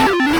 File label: spawn; Techmino